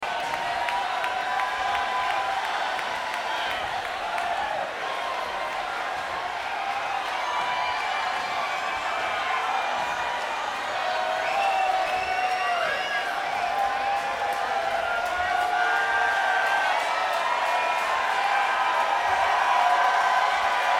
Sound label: Crowd